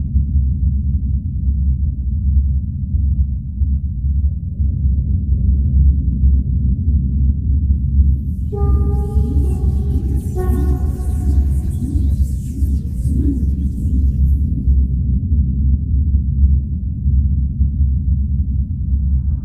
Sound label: Amb Cave